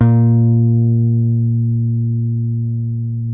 A#2, Bass; Masterbits CLIMAX 7 - Bass; KEN SMITH BS

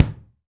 BD Real

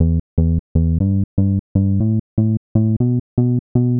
Smooth Bass (120BPM), retro house

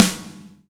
Reg. Snare; Snares

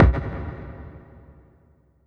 EFX Hardhitter; Polyend; Plughugger

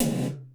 TM GATED; GATE KITS; Crypton; DrumBase5000

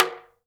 TALK DRM, Heart of Africa; Spectrasonics

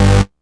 Synth Hit G

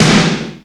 SNARE, Ragga & Dub Reggae

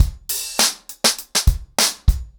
100BPM, ACOUSTIC DRUMS

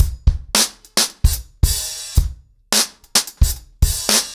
110BPM, ACOUSTIC DRUMS; The Roots Drumloops

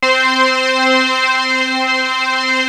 ARP STR C4, Analog Fantasies; USB Soundscan vol.49 - Analog Fantasies; AKAI

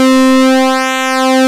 PULSE MOD C3, ARP Synths, Analog Odyssey; E-MU Formula 4000 Series Vol. 3; ARP Synths II

